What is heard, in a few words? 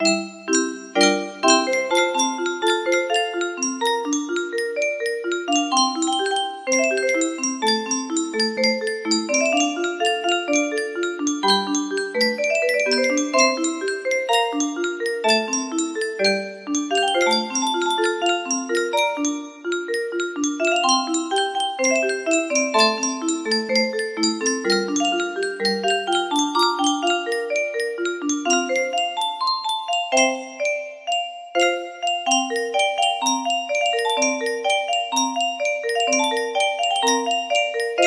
electric piano